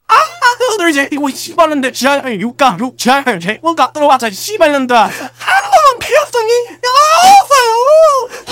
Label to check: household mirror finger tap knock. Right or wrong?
Wrong